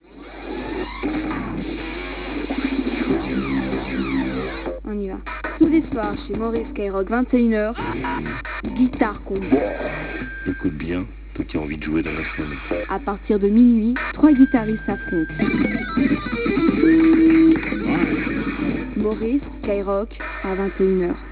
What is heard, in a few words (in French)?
guitare